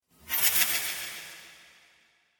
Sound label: Magic Illusion; Magic Sound Effects; Magic - Illusion 07; Role Playing Game